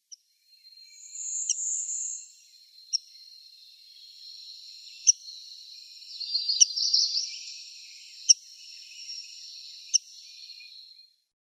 Rose-breasted Grosbeak calls

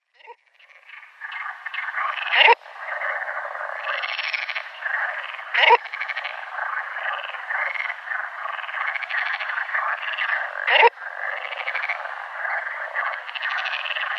Baikal Teal male

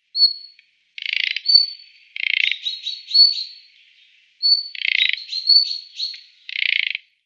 Thrush Nightingale calls

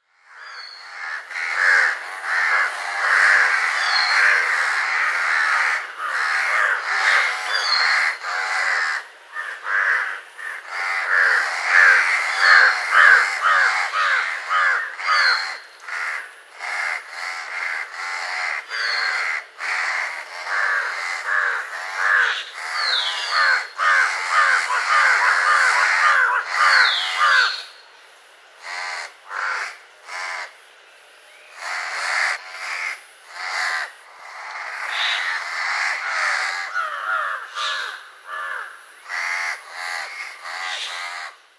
House Crow

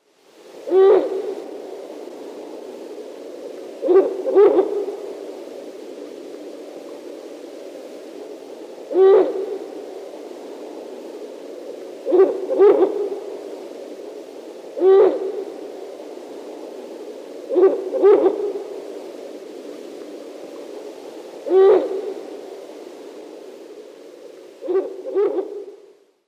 Ural Owl female display

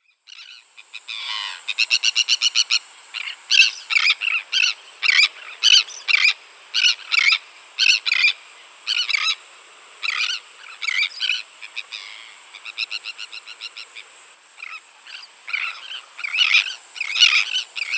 Royal Tern

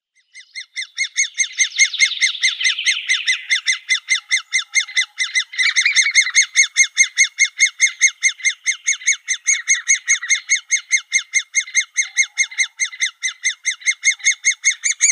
Great Spotted Woodpecker nestlings

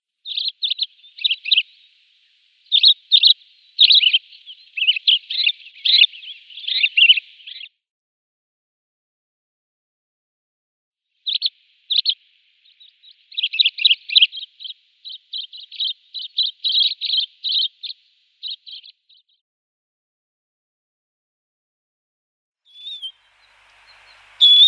Crimson-winged Finch calls

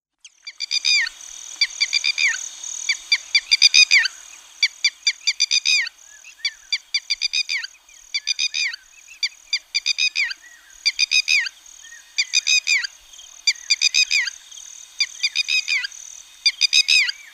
Red-wattled Lapwing calls

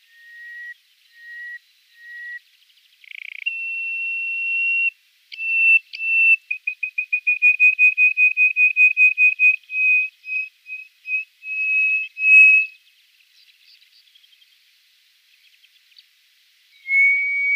Hoopoe-Lark song

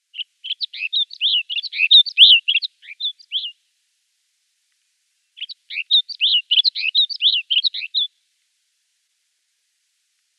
Snow Bunting song

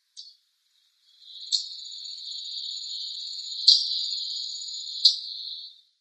Brown Thrasher calls

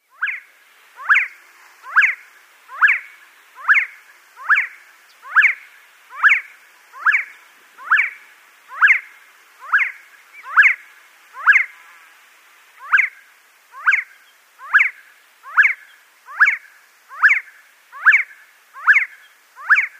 Sand Partridge male display

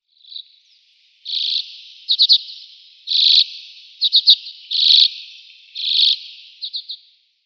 Arctic Redpoll song

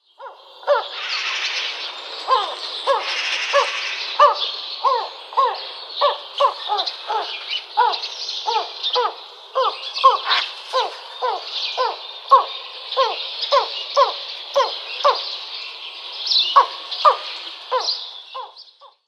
Tawny Eagle

